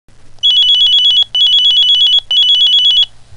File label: minitor tone